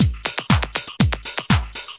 120 bpm, robot breath pipper, drum loops and breaks